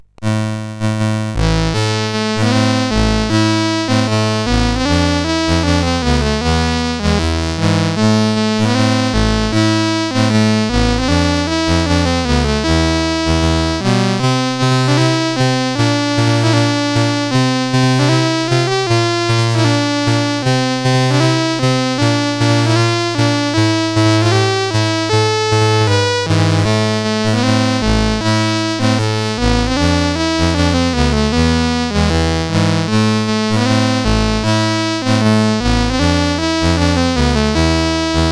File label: sid CSG8580R5